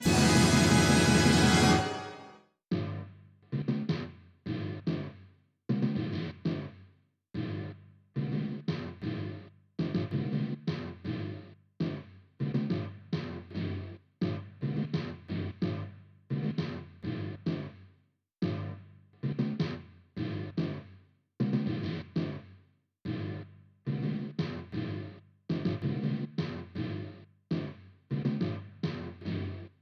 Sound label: BGM Event Fanfare; Coop Event2